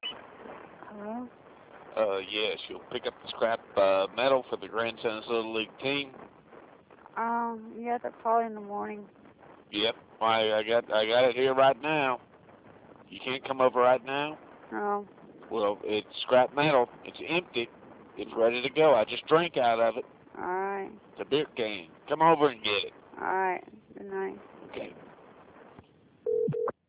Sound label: Scrap Metal